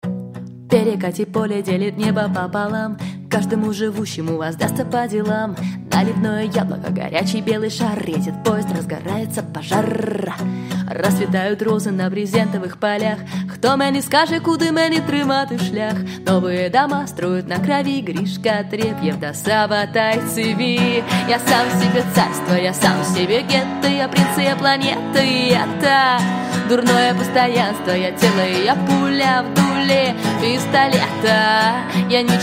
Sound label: acoustic